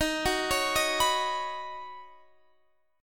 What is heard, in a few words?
D sharp Minor 7th Sharp 5th, Guitar, Standard; arpeggiated; capo 9